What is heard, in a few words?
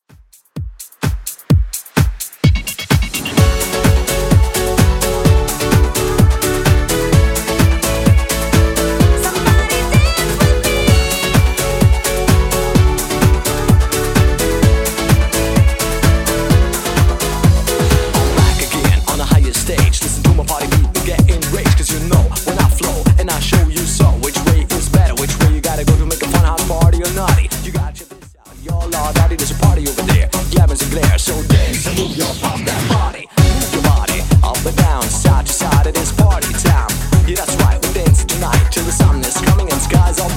90s Redrum 128 bpm